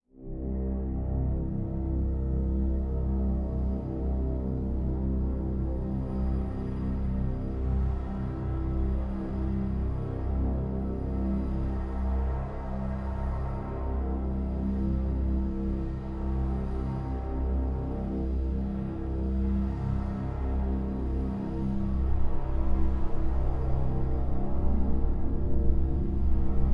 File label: Bass Soundscapes; OB6; Back to Bassics 1